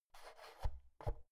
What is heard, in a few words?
oven knob press out soft